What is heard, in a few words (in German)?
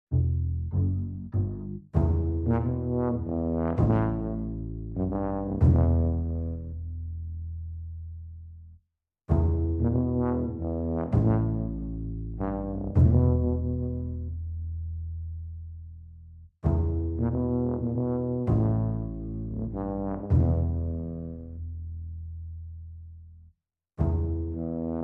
Blasmusik No Guitar